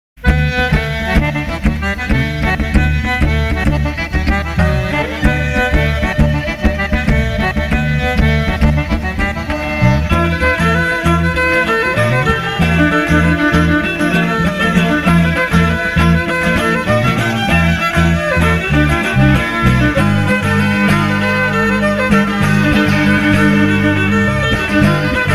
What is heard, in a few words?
kickin